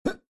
Jump Guy

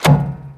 door; Shin Megami Tensei IV Apocalypse; 3DS

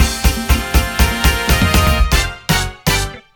catch; meteor world actor

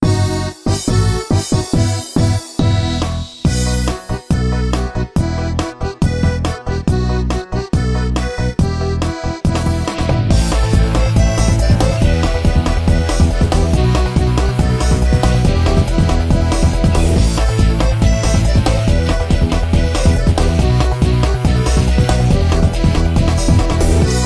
BGM; Ape Escape Academy

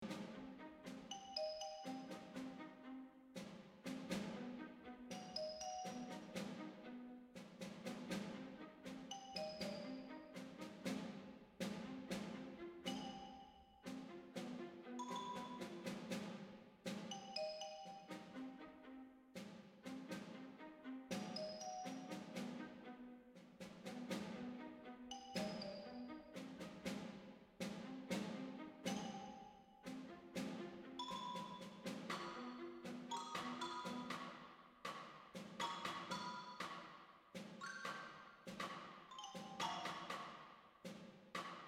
Puzzle Layer; From the Shadows

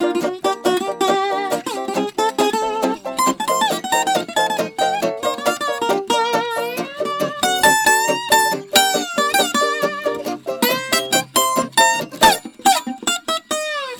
musicloop; easteregg; drivinglicense

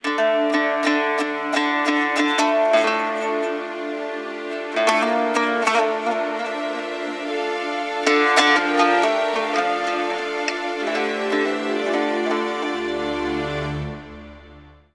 Lose

